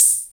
HH HH, Dance

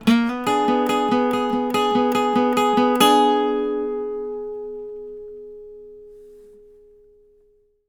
licks, Blues Guitar; ILIO; Fretworks

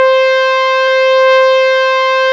SYN, Analog

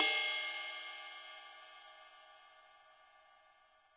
HiHat & Crash, Roland TD-20; HiHats

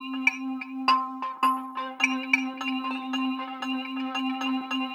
Tape Loop; radiosonics

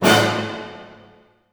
D# DIM FF, orchestra hits; Sound On Sound - Peter Siedleczek's Orchestra

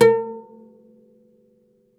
OUD A3 -L; E-MU Producer Series Vol. 7 – Old World Instruments

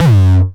909 Grime F#2, Kicks; Mbase 11 Kicks

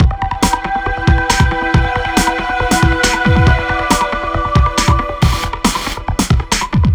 Breakbeat 138 Dmin; Big Fish Audio; Hardcore Breakbeat Action; B Files

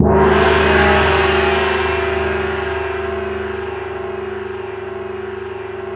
EARTH GONG; ORCH.PERC.; Instruments & Percussions; InVision Interactive; Lightware VOL-1

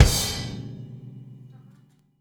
Combo Shard, Processed Drumkits; Sonic Foundry (Sony Creative Software) - Processed Drumkits Zero Gravity Beats; Shards

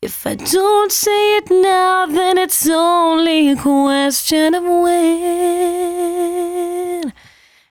113bpm C#, Velvet Vocal; Zero-G

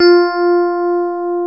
F4 DX Rhodes

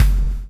CHAIN; Spectrasonics - Backbeat

